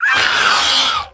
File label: Devilsaur Attack